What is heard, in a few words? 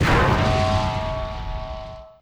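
Rivet Hit Interior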